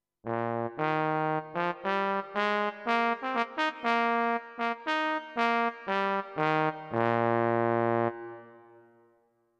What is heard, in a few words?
Trombone